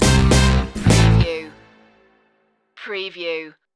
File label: soul beat ending